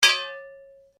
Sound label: Blade Heavy Moving Impact, Sword; Foley sounds for melee weapons; Swords; Combat; SoundEffects-Foundation1